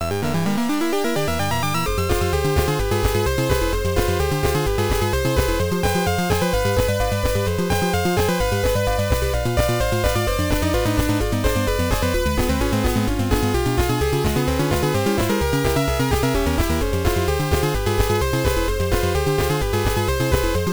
Boss fight; doraemon nobita no dorabian night turbografx 16

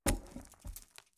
ledge impact; Kismet

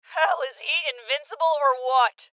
Heavy Hit Female; Rebel Cops

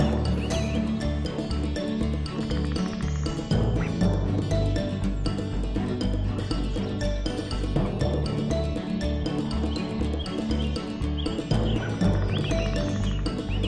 Woods Theme Amb; Puffle Wild

